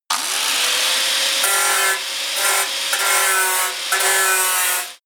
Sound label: grinder deep on load